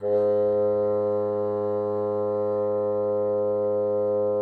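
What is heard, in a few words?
bassoon piano G#3; Xsample VOL-6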